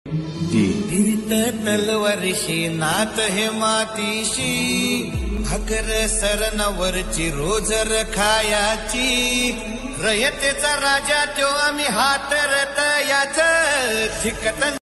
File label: song ringtone marathi ringtones phone ringtone